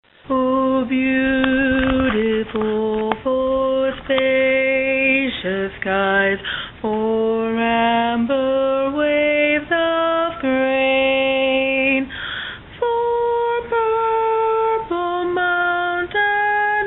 america bari alone

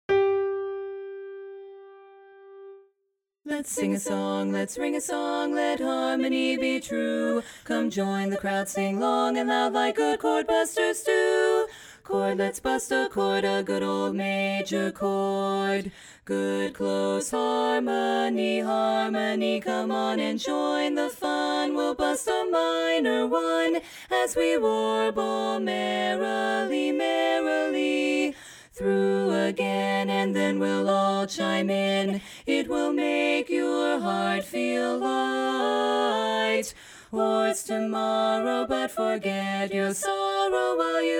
lead missing, chorus

